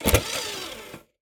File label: Eject, DVD Drive Internal